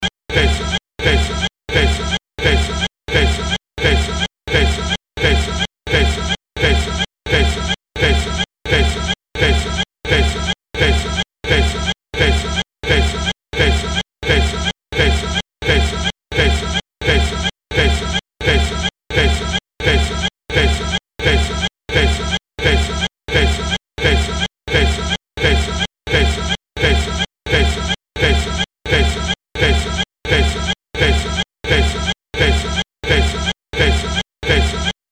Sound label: drum